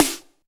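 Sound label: snare drum; Best Service Gigapack 2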